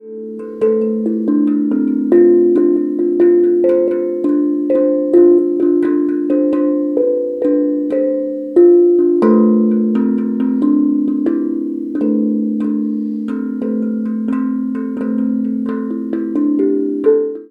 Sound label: steel tongue drum